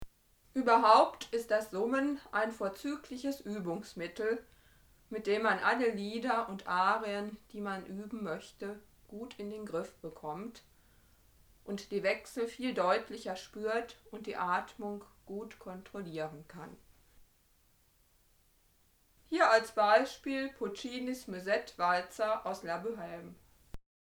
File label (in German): Summen